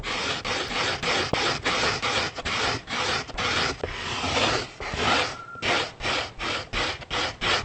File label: scraping